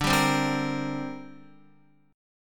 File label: D 7th Suspended 4th Sharp 5th, down, Guitar; D7sus4#5 strummed